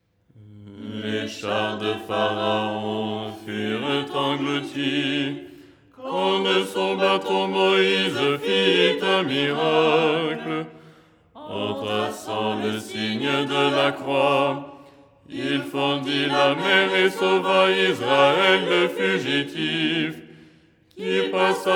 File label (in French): basse